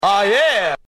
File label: scratch